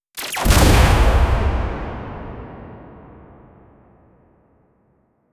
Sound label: video game attack action s